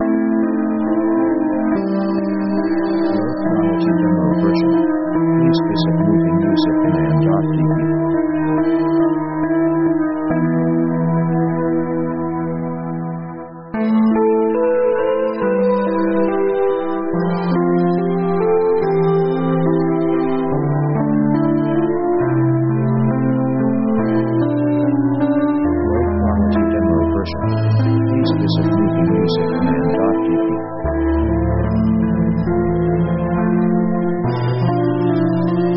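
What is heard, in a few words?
synth orchestra 70s melodramatic; synth themes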